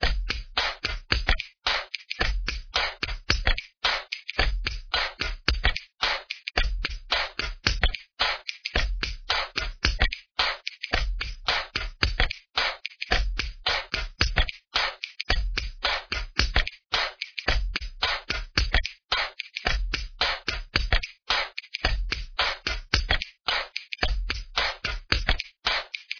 Heat Beats Break; HeatBeats Song17 Break2

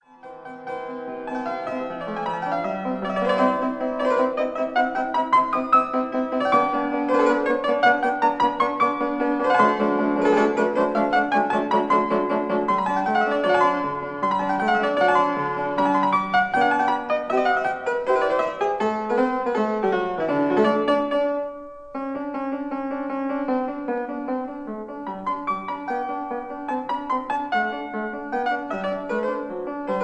Piano Sonata Allegro con spirito; Mozart Piano Sonata No 7 K309 mvt 1

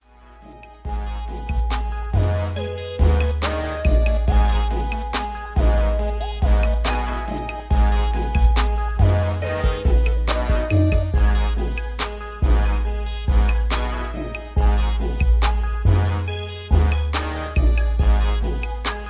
70BPM

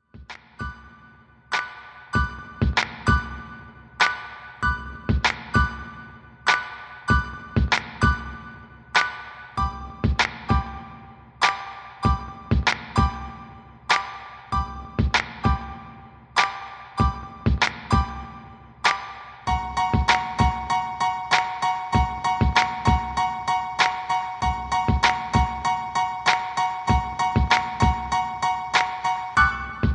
Piano Short, Unplugged

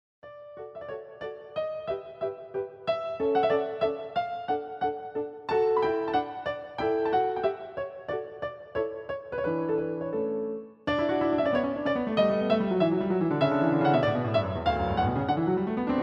Piano Sonata; No 17 in D K576 Third Movement Allegretto; Mozart

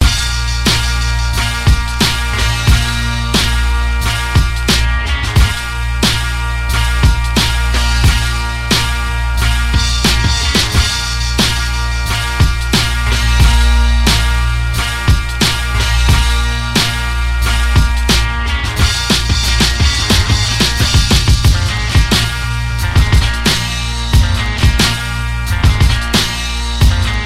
beat

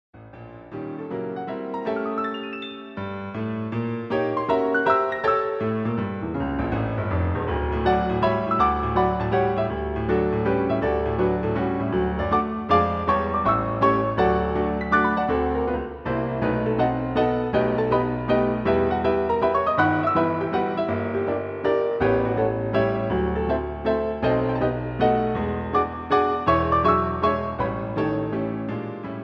piano roll

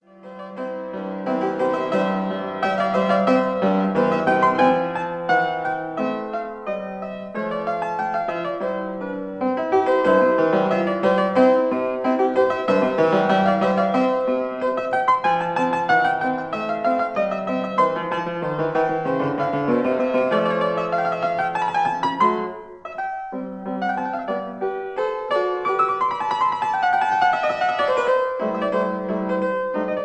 Piano Sonata Rondo; Mozart Piano Sonata No 7 K309 mvt 3

